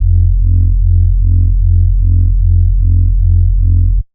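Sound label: bass sustain roller F; Polyend; ARC Noise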